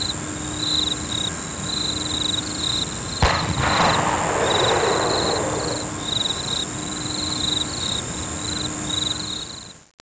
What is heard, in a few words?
insect